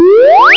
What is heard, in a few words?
Bonus; Brickles Deluxe v1.1